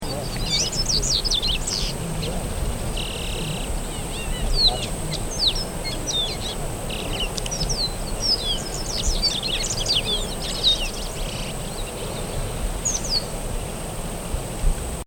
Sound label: Black-bellied Seedeater